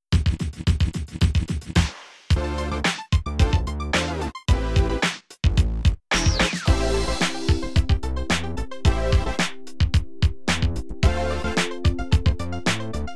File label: casio px s3000 test